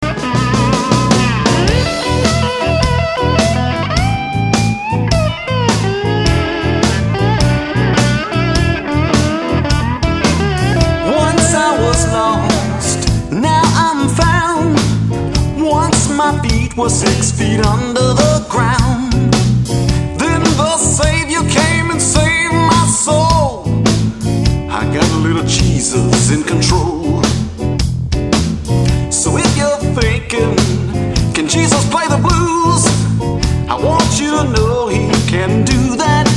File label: blues